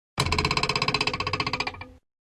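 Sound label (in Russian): линейка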